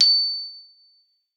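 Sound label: GLOP C#6, glockenspiel; Xsample VOL-4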